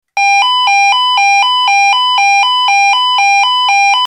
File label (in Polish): ton